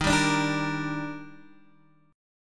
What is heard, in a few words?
Eb Major 7th, Guitar DADGAD